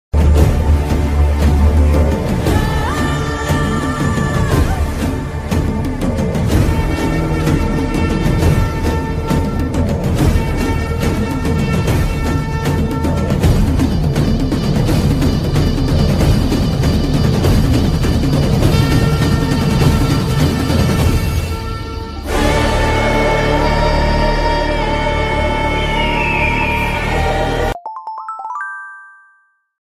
title announcement bgm ringtone; Chiyaan Vikram

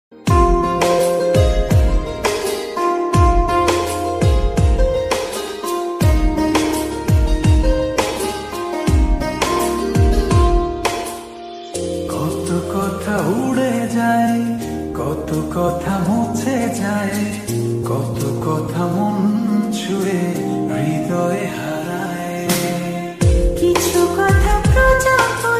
bangla romantic ringtone, bangla lofi ringtone; bangla love story ringtone

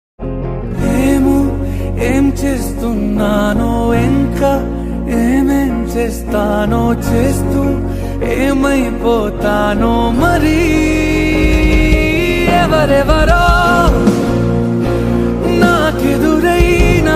telugu ringtone love bgm ringtone

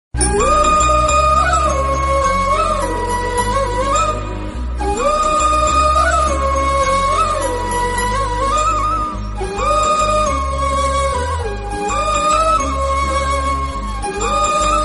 love bgm tamil ringtone; sandakozhi

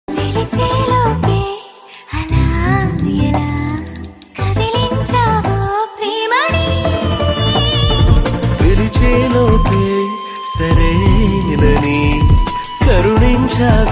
telugu love songs

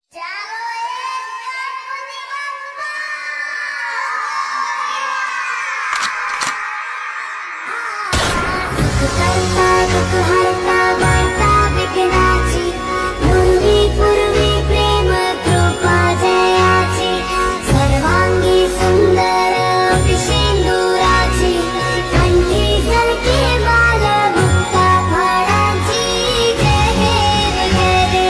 devotional ringtones ganpati bappa ringtone; ganesh ji

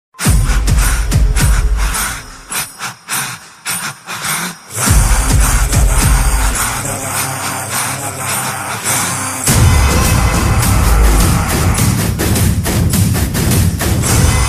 interval bgm, tune

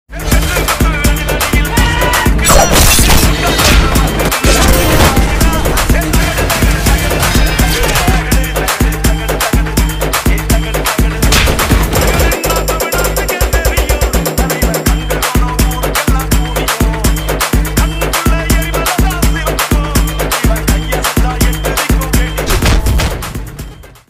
song, tamil mass

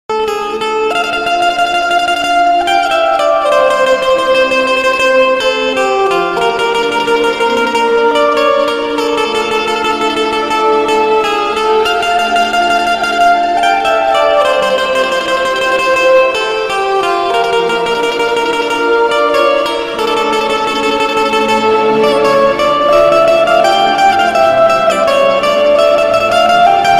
instrument ringtone; 15th august special ringtone; karma song

